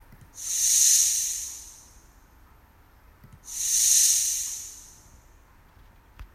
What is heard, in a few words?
Snake hissing